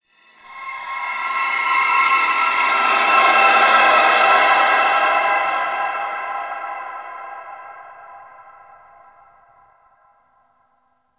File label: Space Exterior Dist Call, Ambience, Space Battles; Warframe